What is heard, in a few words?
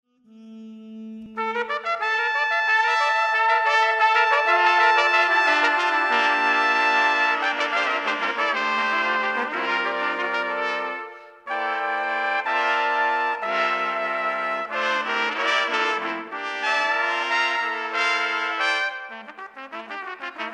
Phrygian Phantasy